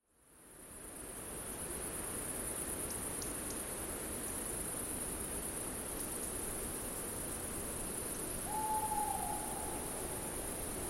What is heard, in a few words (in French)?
hulotte